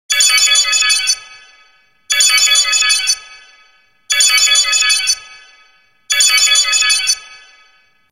Vibration; Sony Ericsson; K850i

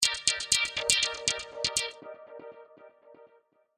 SMS; NGM; Argo; MICROMAX

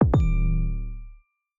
Dudum, notifications; Samsung Galaxy S25 Ultra; Fun

